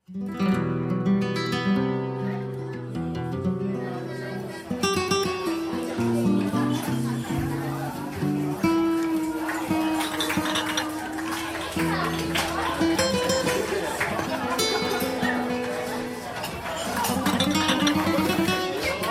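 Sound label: remix instrumental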